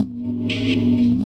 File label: EQ Perc, Percussion Mix